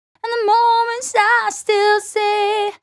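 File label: Chorus Female Vocal Dry, Gb, 126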